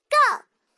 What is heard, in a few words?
countdown go